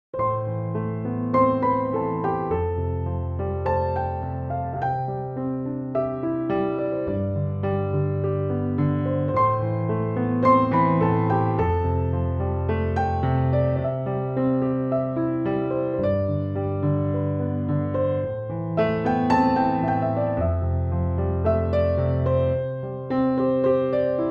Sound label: Piano Music